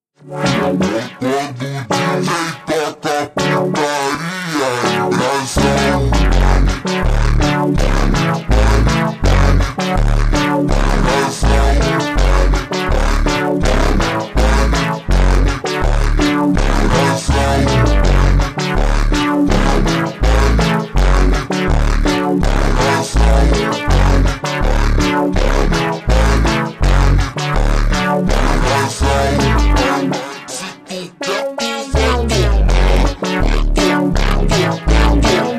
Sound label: Montagem hyper Slowed